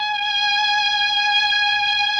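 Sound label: MELLOSTR, Mellotron Violins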